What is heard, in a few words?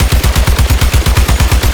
Gatling Gun Loop Stereo; Warframe; Tau Gatling Gun